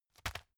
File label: tissue package drop soft